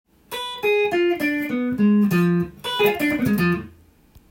dim picking, sweep picking with diminished chords, guitar